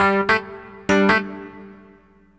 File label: Electric Piano, Loops, Keys